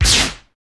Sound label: Laser Blast Quick